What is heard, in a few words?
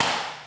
curt gunshot; Gachitale